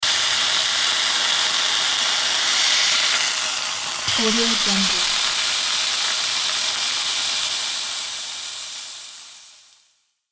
sizzling meat

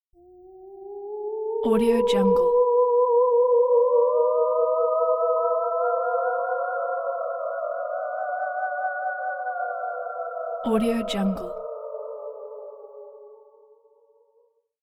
ghost wailing

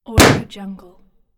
balloon popping

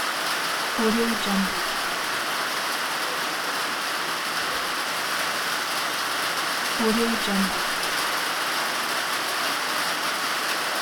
rain loop

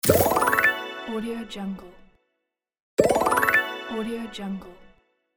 special power up

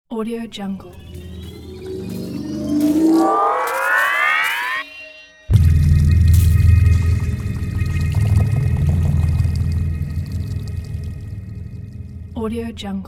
hitech rise impact

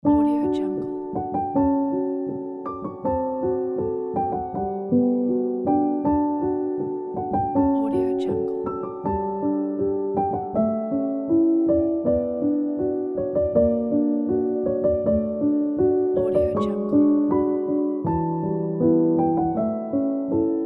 lyric piano

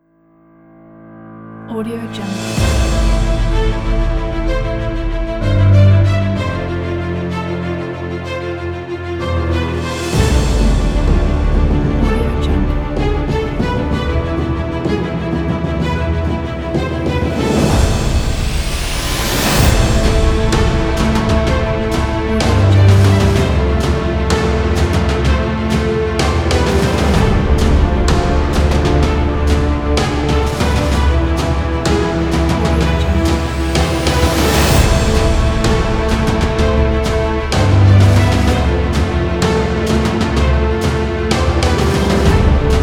dramatic cinematic trailer

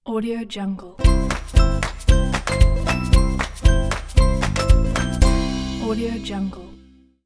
fun logo

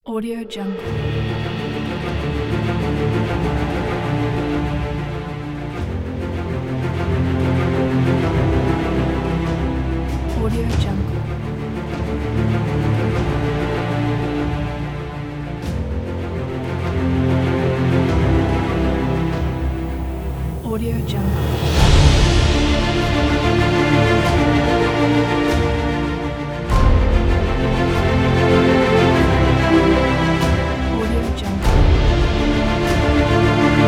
the epicness

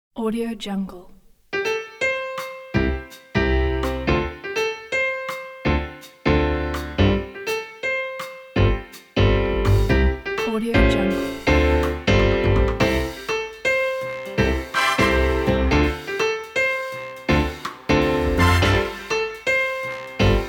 jingle bells jazz band

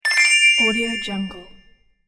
video game bonus bell

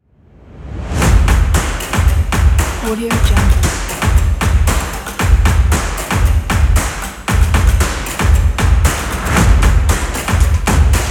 energetic stomp

